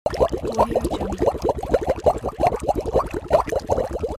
water boiling

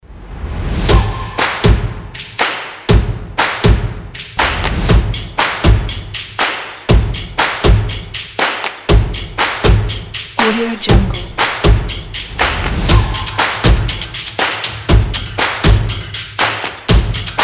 energetic stomps and claps; d-music

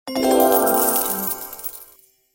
magic coin

